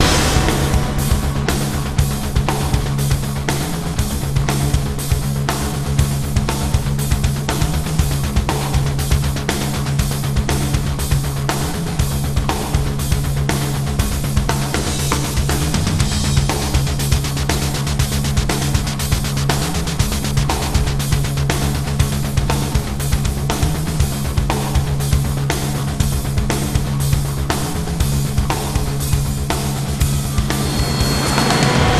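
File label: music, synth and percussion loop; transformers war for cybertron gamerip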